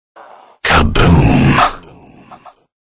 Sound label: Kaboom